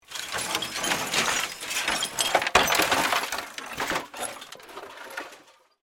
Crash Computer, Crash, Computer, Monitor, Debris, Movement; SoundEffects-BlastWave